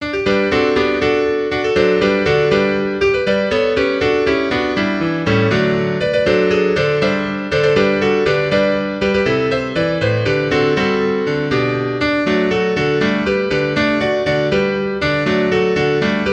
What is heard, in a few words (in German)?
alle wiesen sind gruen klavier